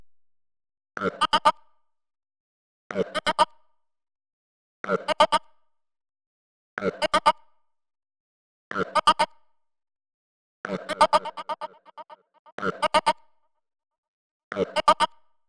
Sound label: 124 vox